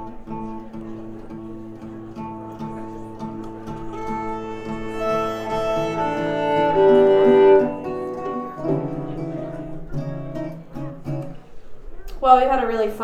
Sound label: Tuning chat; Bluegrass